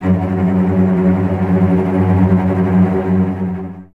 F#2, String Ensembles Cellos Tremolo; Miroslav Vitous

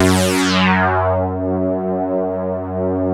LEADS; Masterbits; Vintage Voltage

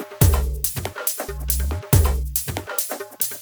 drum Loop 140BPM no kick no clap; Vengeance - Essential Clubsounds Vol.5; VEC5 Drumloops; VEC5 Multi Loops 140BPM

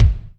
BD evolvr, Yamaha DTXpress Acoustic Kick

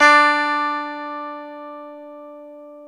GTR E12, Ac 12+ E.12; Club-50 - Foundations Roland; Dual Guitar

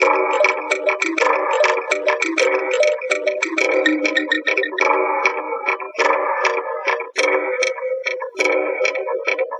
Mbira Echo, Acoustic Beats; Sonic Foundry (Sony Creative Software); Myths of Technology

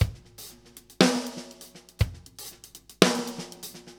New York Loop, Drum Tools, Laidback

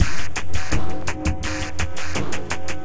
LUNAR PHASE GROOVE; FRENETIC FRACTAL GROOVES

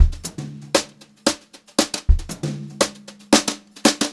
Brushed Drum Loops, JAZZY

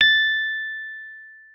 A6, RHODES; Session Instruments; Best Service ProSamples vol.42

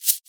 Shaker; Equipped Music; Loungin House

